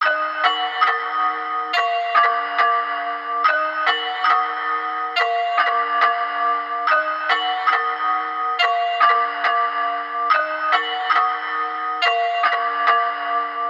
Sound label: Synth Melody 140bpm D drill dark